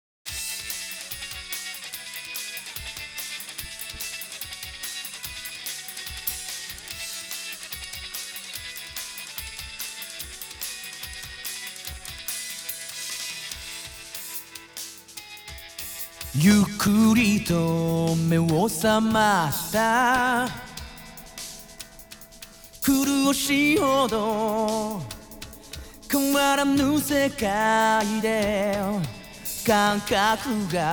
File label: Acapella; Gintama